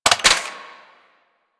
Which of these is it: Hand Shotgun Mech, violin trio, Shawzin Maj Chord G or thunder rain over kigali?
Hand Shotgun Mech